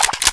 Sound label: release, weapons; brainbread